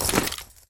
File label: equipment; taunt; player; yeti standee; pyro; source engine; tf